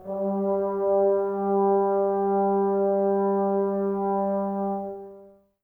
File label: BRS TBS G, Woodwind Brass Ensemble, Brass